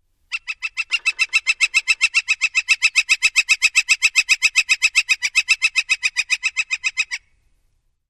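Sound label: Northern Flicker, kikikiki red-shafted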